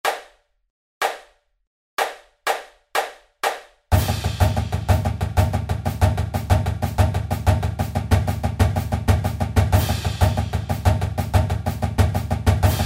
Triplet Grid 124 bpm

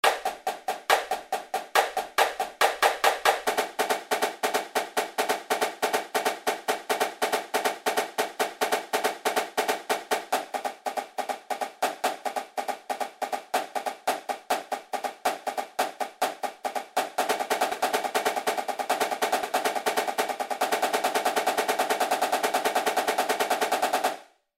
Double Beat 140 bpm Snare

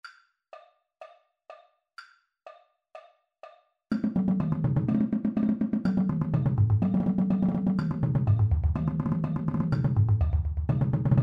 Big Dumb Diddle 124 bpm Bass Drum

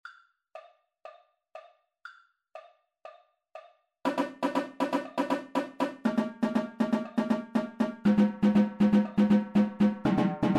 Double Beat 120 bpm Tenor

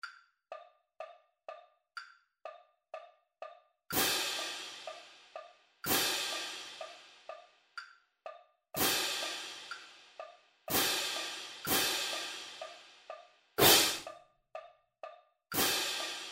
Big Dumb Diddle 124 bpm Cymbals

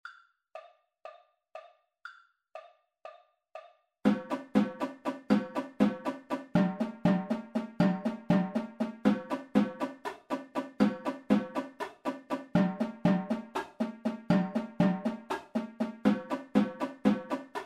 Accent Tap 120 bpm Tenor

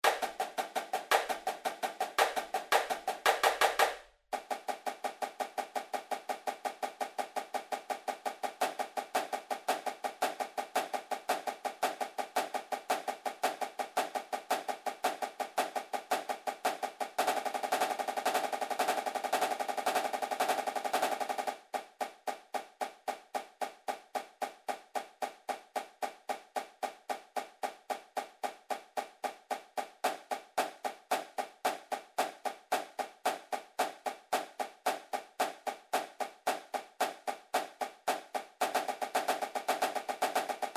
112 bpm Snare

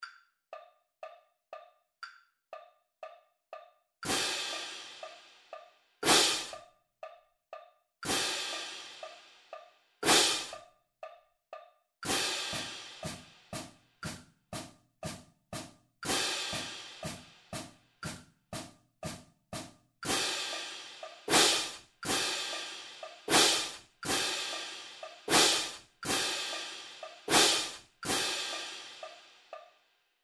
16th Note Grid 120 bpm Cymbals

